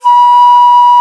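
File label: alto bansuri, World Winds; E-MU Producer Series Vol. 7 – Old World Instruments; World Combos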